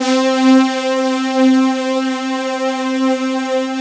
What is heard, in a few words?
JD800, PAD; Alpine Volksmusik